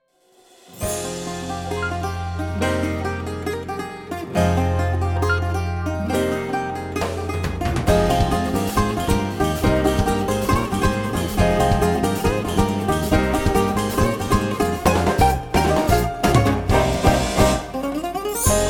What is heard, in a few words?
Bouzouki